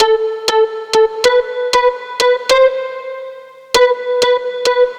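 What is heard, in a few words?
synth, Roots Funk; USB Soundscan vol.41; AKAI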